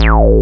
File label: DB9 Bass A0; Proteus 2000; Multisamples; Digital Sound Factory Proteus 2000